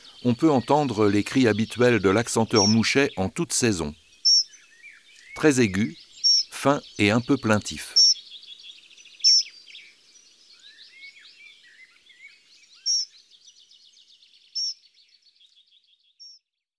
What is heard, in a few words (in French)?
Accenteur mouchet, Oiseaux des jardins